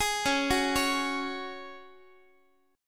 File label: C sharp Suspended 4th, Guitar; C#sus4; arpeggiated; x,x,11,11,10,12; 4A,4E,5A,5D,5G#,6C#